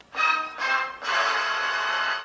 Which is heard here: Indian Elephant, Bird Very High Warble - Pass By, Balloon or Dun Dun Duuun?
Dun Dun Duuun